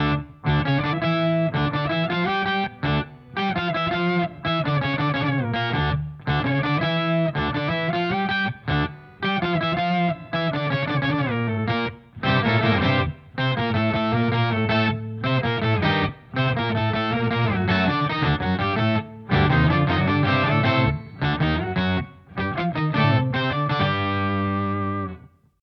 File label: Boss GT-1000 Core Octaver; Vergleichstest